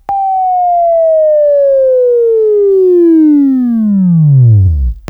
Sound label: Buzz, Left